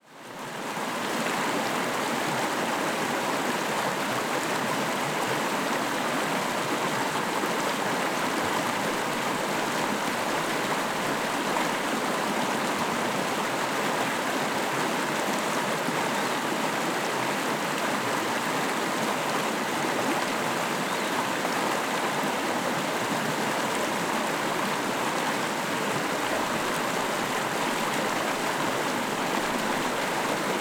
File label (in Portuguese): Queda de agua de rio em Cavalcante Cachoeira pequena Corredeira